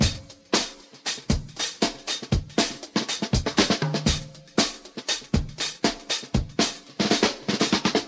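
119 bpm drum loop sample d key, kick tuned to the D note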